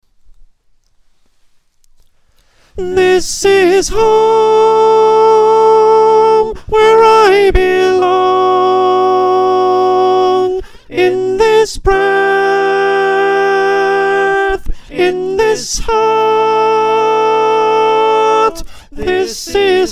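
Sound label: Soprano, choir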